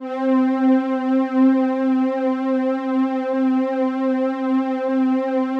C3, SYNTH WAVES; Music Store Sound Service; Einstein

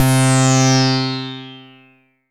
Dance Floor Bass, Multisample Basses

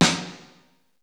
Kawai r-50; Drums

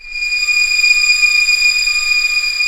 STR A5 PP; Sound On Sound; Peter Siedleczek's Orchestra

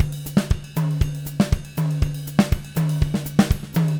120 CARIBE; Sampleheads; Peter Erskine Living Drums

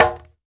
Congas, African Contemporary

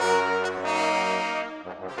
Brass; Sonic Foundry (Sony Creative Software); Orchestral VOL-2; Modern 16

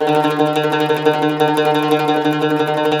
CUMBUS TR, Cumbus Tremolo

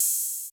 Open HH, Yamaha RY20

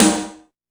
KORG 01W KIT; Rubber Chicken Software Sample Bank

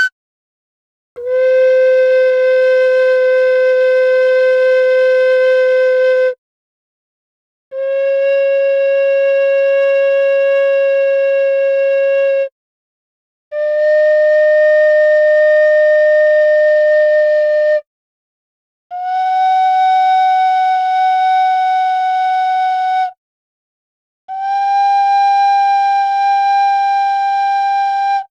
Gamelan; Propeller Island - Complete Gamelan

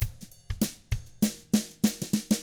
FUNKY, Drums

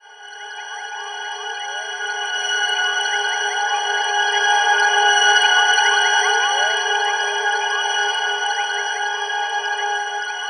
Woodnotes G#; Zero-G Seismic Frequencies; 90 s Sample CDs

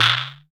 perc mod scrapper

